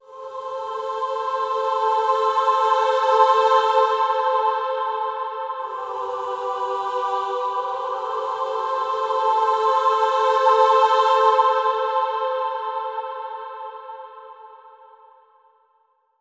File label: Granular Choir Am 65Bpm; Construction Kits; STEMS; Ghosthack; SkyRealm; Kit 30 - Darkest Hour